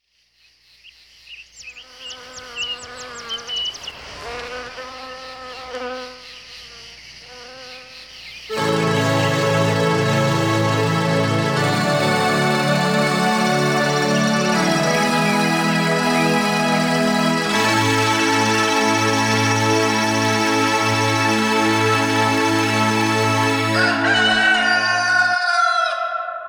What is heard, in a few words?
instrumental